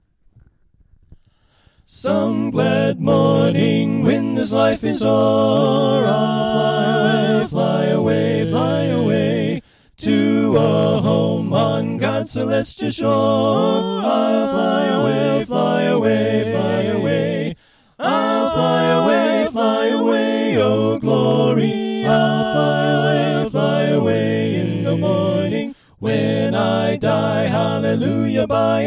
Alto Right

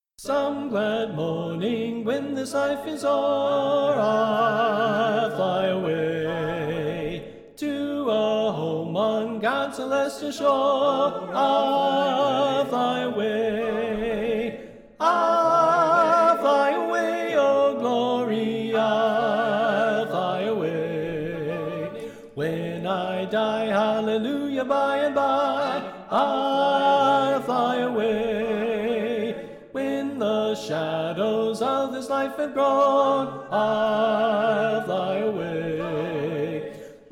SATB Soprano Left

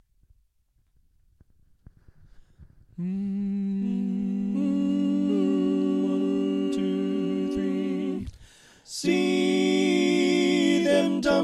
tenor; Pennsylvania Groups; NBC

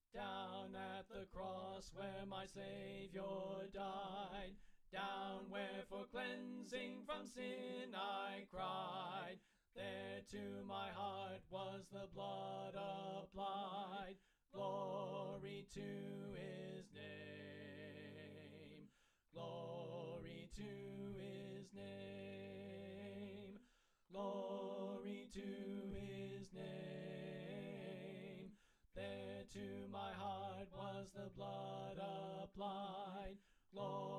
Tenor Left

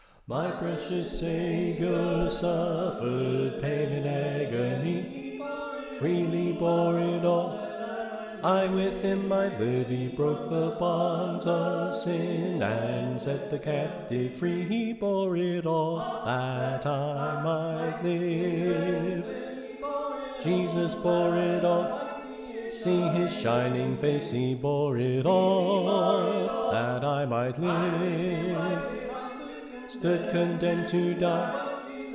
Bass Left

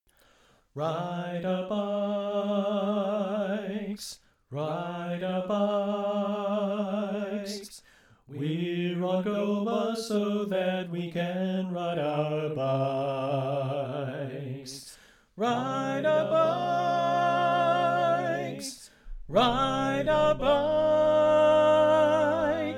Tenor Left

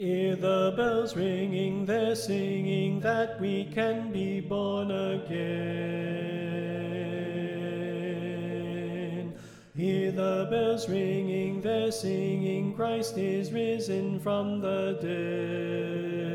Soprano Left

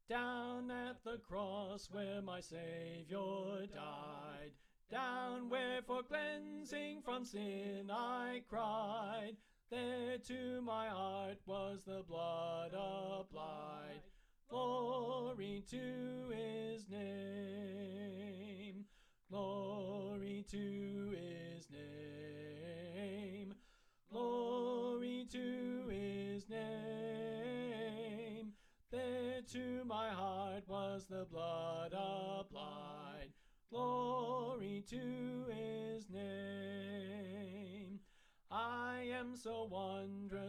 Soprano Left

